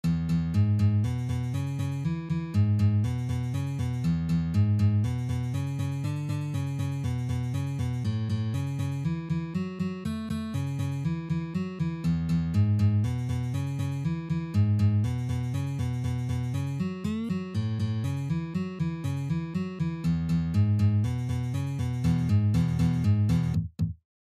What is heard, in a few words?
Shuffle in Em